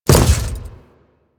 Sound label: Step Default, Footsteps; Warframe; Grineer